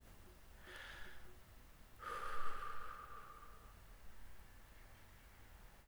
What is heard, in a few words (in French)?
SOUPIR